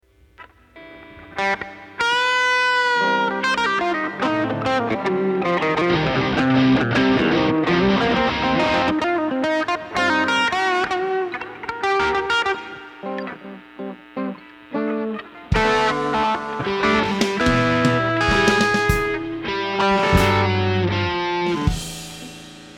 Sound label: Expensive Drum Samples Mix